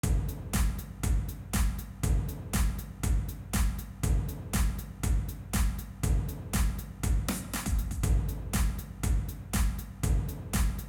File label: BGM Game; PAPER MARIO Color Splash; wiiu